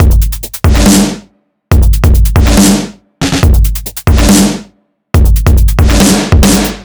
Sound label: Sky s Limit B, dubstep